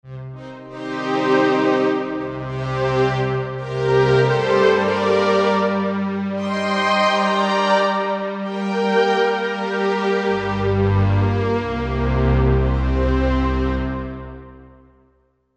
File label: 2 voices; Korg MSC-12S Synth 3